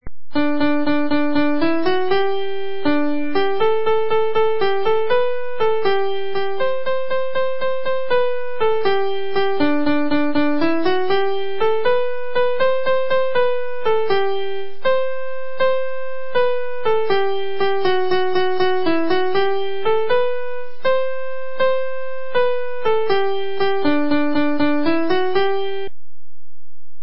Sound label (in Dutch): liedje piano